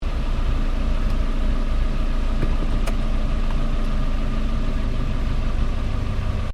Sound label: Car Toyota Starlet idle loop; Toyota hire car in Japan; Cars (Sound Effects:Vehicles)